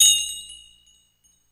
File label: Bell Drug Store Single, Doorbell; Bell Drug Store Sngl